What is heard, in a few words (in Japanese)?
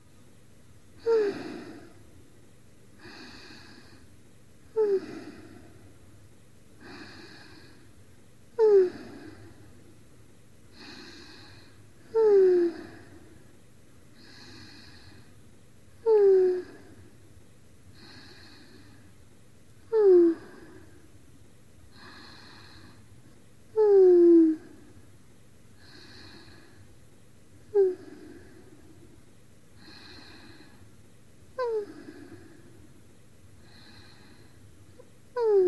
寝息ループロング(バイノーラル編集）; みみあね。 ～世界で1番弟クンを甘やかすお姉ちゃん～【囁き・添い寝・立体音響】